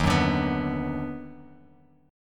Eb Minor Major 7th Sharp 5th Eb down Bass Standard, chord; EbmM7#5; strummed; JGuitar